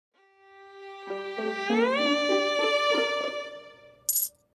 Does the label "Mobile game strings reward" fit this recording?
Yes